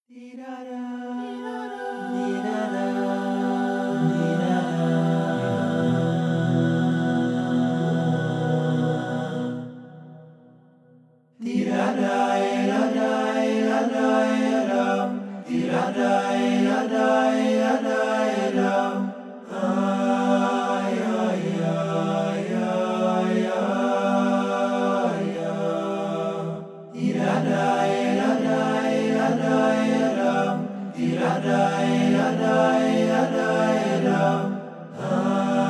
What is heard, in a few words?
Nigun; Barditchever